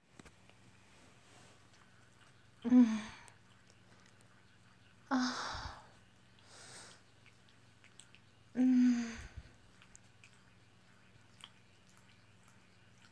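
[F4M] Please Daddy [WET noises][begging for daddy][toy buzz], female; Enjoying my time off asking for daddy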